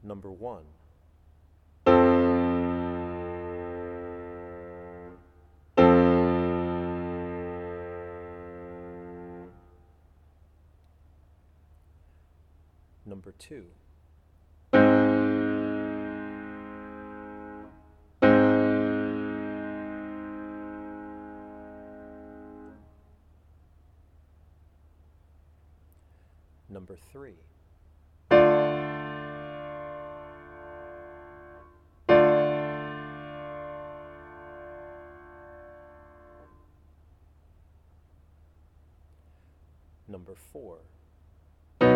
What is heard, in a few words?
chords; eartraining